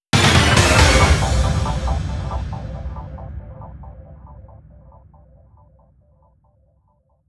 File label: breaking news logo only stabs; front-logo; WLS